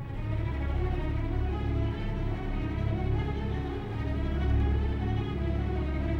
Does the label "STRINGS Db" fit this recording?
Yes